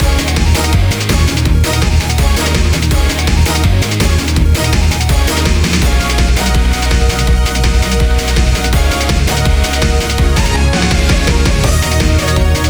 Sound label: Stage Loop; Shift Circuit